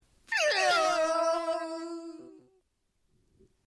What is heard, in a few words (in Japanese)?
和風の音色